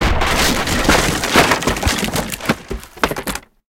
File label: wood crash; tanks; War Thunder